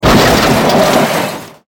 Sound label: crash metal med